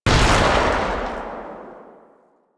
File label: Shot, Rifle; Warframe; Thanotech; Trumna Prime